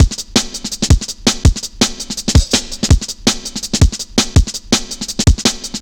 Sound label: 165, drum loops, drum & bass; Total Drum&Bass